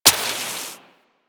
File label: Water Sprayer Mech; Warframe